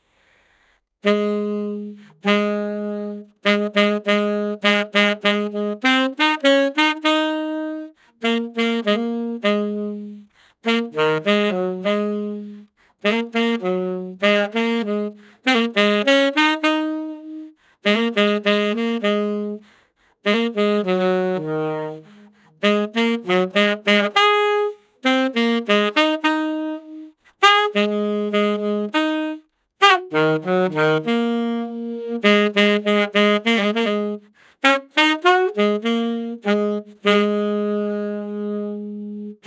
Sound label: beautiful and romantic